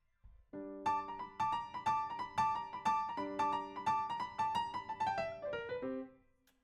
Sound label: Piano, RNR for piano